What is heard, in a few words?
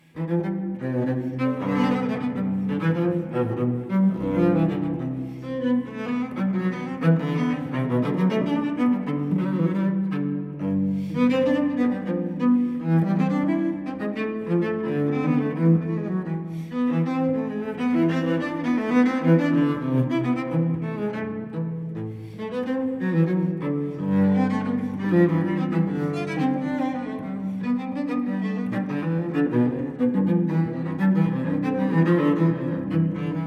Cello Suite No. 3 in C major Bourrees I II; BWV1009; Johann Sebastian Bach